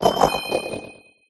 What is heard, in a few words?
indeedee male cry; cobblemon; pokemon